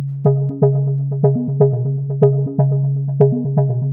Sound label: 122 BPM Dub B maj G min Tonic C; mmb studio soundtrack essentials